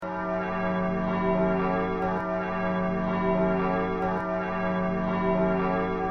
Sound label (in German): glocken